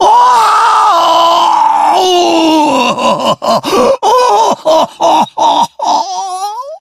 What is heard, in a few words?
cry; Kinzo